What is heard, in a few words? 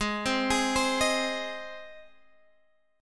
Gsharp Major, Guitar, Standard; arpeggiated; x,11,10,13,13,11; JGuitar